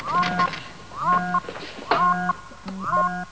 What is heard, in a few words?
alarm